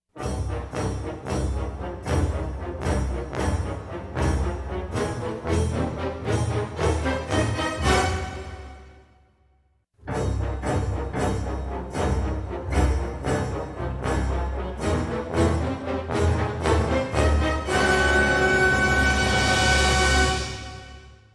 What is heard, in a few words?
suspence short; Chrome